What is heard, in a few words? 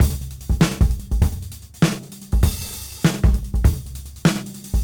Cym Beat 99 BPM; AMG - Keith LeBlanc Phat Butt Jams